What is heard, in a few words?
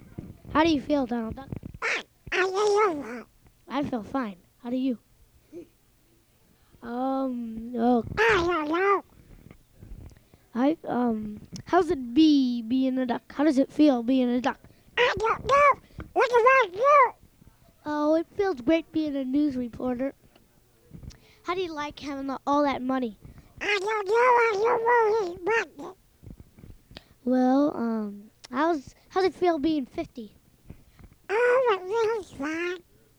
Duck